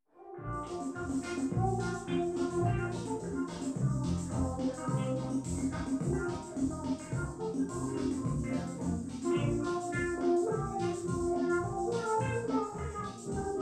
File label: BGM; Abertura do Chapolin Colorado no SBT